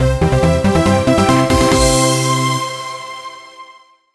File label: MUSIC EFFECT Platform Positive; stereo; Universal Sound FX; MUSIC EFFECTS